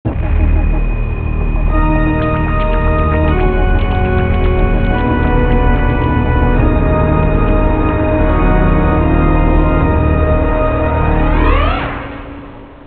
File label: lose